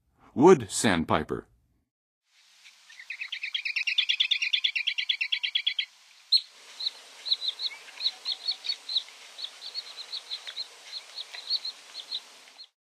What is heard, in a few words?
Wood Sandpiper; N. America; Songs of Birds of North America; Western USA Songbirds